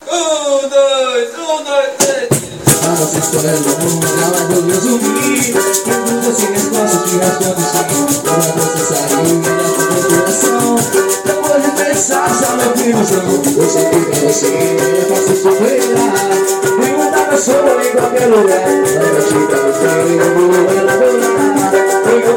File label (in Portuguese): samba ensaio